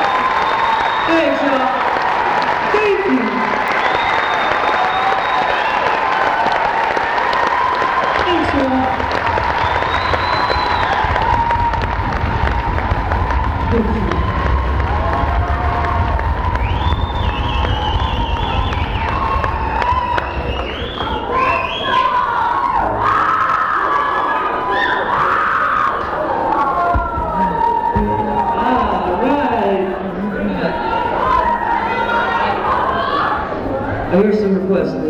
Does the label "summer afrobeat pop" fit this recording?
No